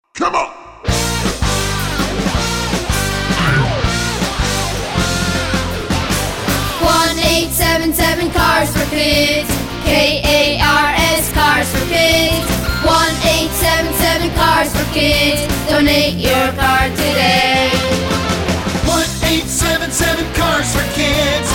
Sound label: rock; WFAN